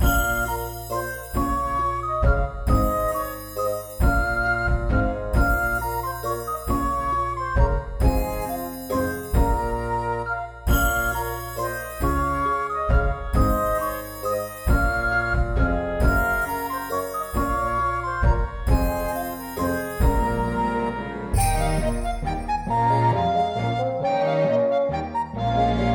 Sylvan Waltz (loop main)

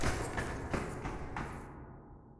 stairs

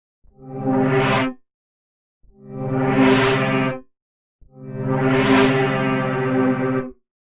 teleport

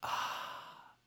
healed

